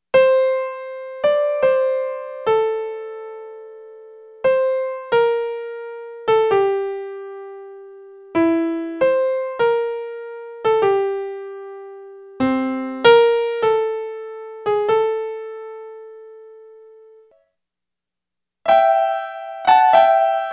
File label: pitch change B, Yamaha REX50